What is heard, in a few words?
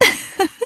ufufu